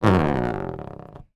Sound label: marble single roll across metal rough surface; zapsplat